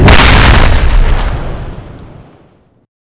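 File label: turret explosion end, weapons; zmall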